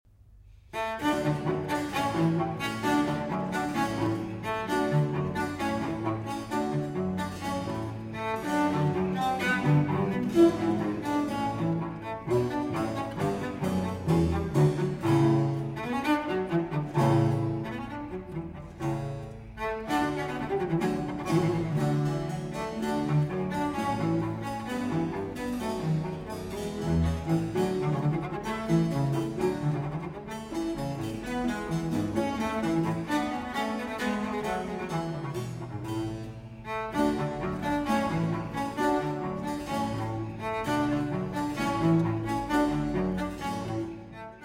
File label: Cello Sonata in E-Flat Major; Allegro; Vivaldi